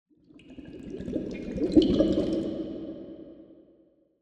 water swell; soundscrate

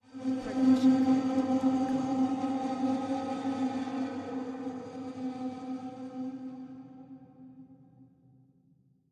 ghost wispher; soundscrate

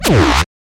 stylized glitch sound; soundscrate

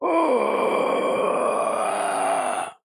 Scary Voice; soundscrate

